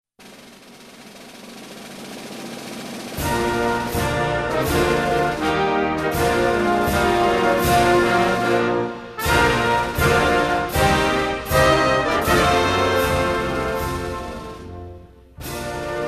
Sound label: National anthem of Pakistan